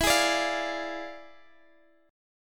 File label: E 7th Flat 9th E down, guitar; strummed